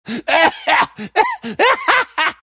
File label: gyro laugh; Gyrocopter